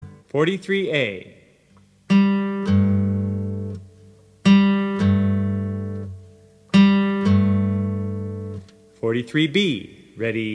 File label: travis picking